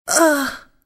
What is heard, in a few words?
death, female